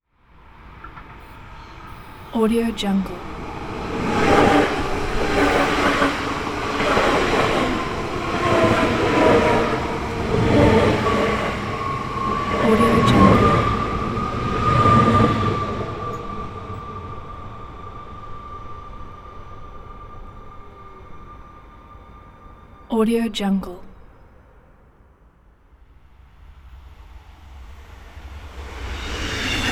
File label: passenger trains passby pack